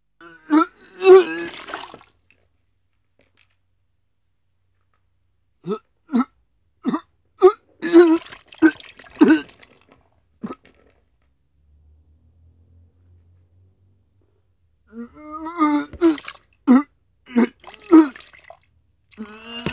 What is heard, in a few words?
Various Vomits; Halloween Sound Effects